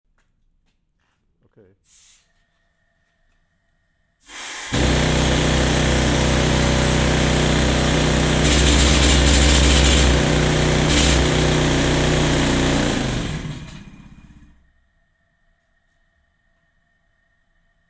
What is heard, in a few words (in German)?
pressluftbohrer; Rammelsberg